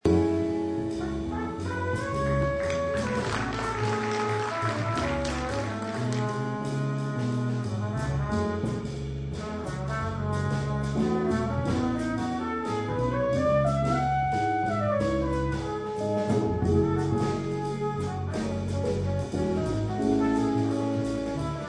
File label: solo